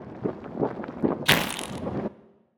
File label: Fart geyser